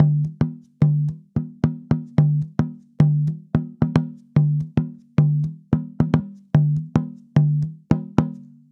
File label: Tambora, African